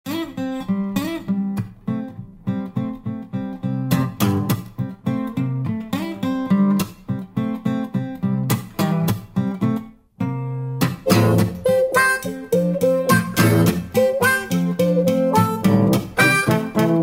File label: blues